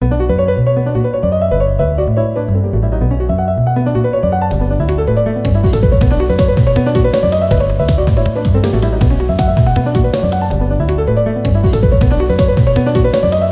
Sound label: map fighting music; Echoria Ancient Echoes; punish4